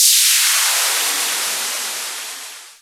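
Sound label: Boss DR-202 percussion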